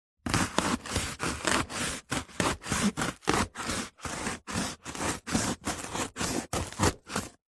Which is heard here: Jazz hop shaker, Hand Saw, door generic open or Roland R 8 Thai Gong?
Hand Saw